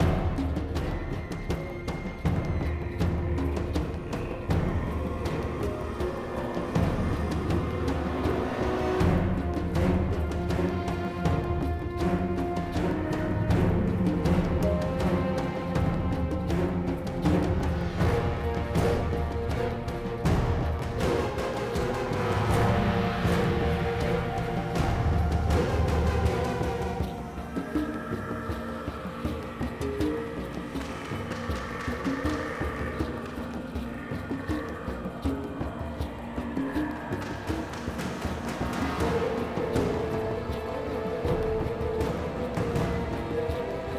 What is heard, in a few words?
Combat; Order and Chaos; Envir Tanned Combat